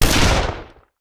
Rifle; Sound Ideas; Guns

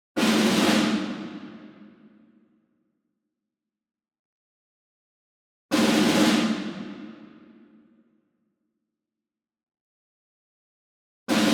ENSEMBLE SNARE Group Roll Far; boom library; Cinematic Strikes Construction Kit

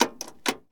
Plane P40 Lever Cowl Flap; Airplanes

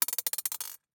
DICE on Glass, Throw and Roll, Mini, 1 One Die; Samples; ArticulatedSounds; DICE Sfx Party (Extended)

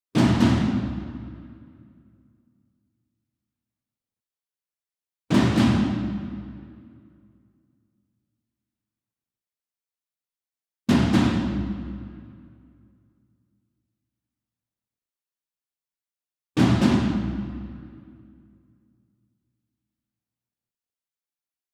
ENSEMBLE DRUM Concert Toms Double Far; boom library; Cinematic Strikes Construction Kit

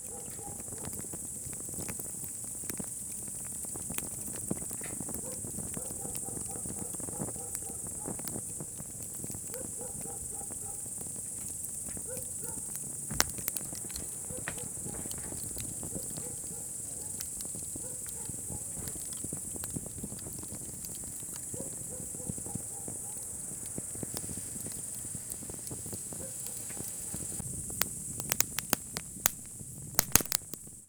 fire dog background; best service Blue Box

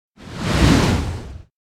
Whoosh Big Swift By Giant Strokes; Hollywood Edge; sound designer's toolkit v2

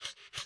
dog idle; Apotheorasis

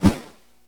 Swish Swing Baseball Bat

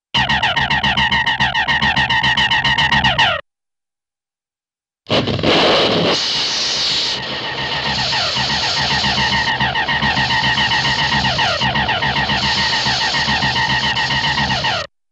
Laser Ray Gun Firing; Cartoon; Hollywood Edge; Cartoon Trax

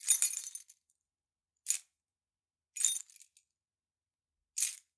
Generic Click Metal Small; boom library; The Interface

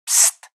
pssst; Pitch Black - A Detective's Demise

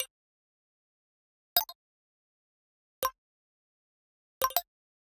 Digital Click Neutral

